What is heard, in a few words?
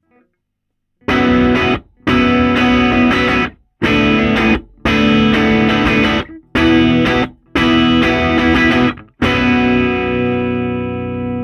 Guitar Box - C12 Omni; omnisphere (koule)